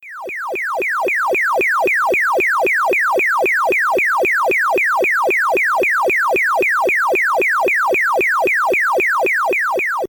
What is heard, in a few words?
ghost return to home; pacman